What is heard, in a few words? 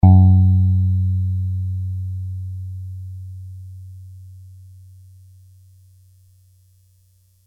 Piano F plus 17